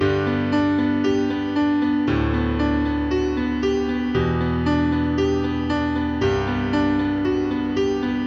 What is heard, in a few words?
g major indie pop rock piano loop part a 116 bpm; LOOPS